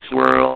swirl; edge extended; android gamerip 2012

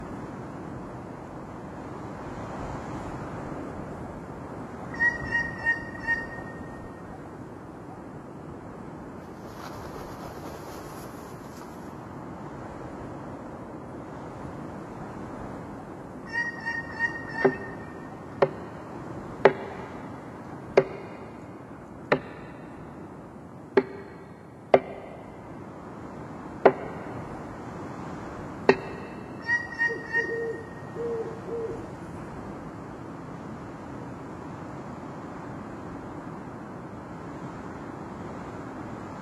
Frozen Valley Ambience

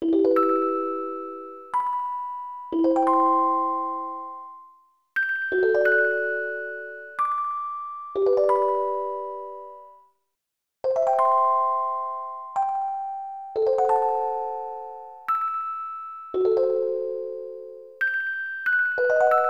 Only a Music Box Remains; Mystic Ark; SNES

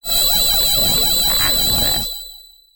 Beast Guts Portal Open

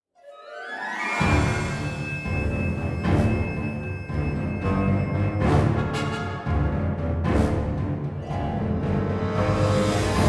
Starfighter Music; Galatic Starfighter

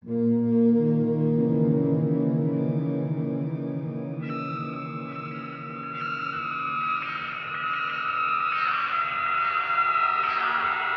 Amb; Amnesia: The Dark Descent

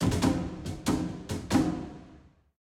Music Battle

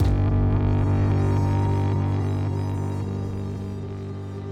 Shot 95bpm; battlefield hardline; ps3 ps4 windows xbox 360 xbox one

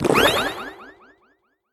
Potion drink

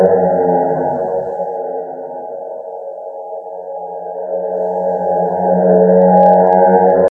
Meow; Doom 3

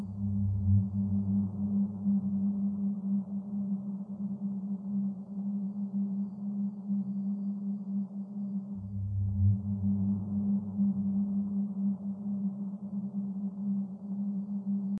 loop Suspense; Doom 3

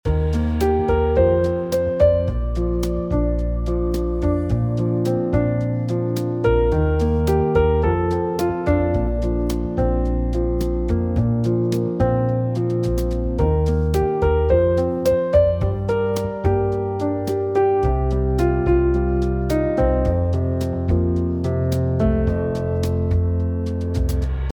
Living Room - Relaxed, Peaceful; IKEA Smart Home Planner

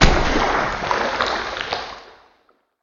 Slime Beam Start

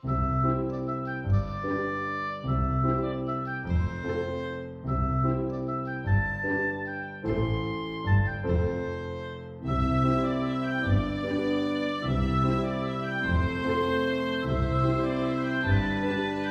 map music

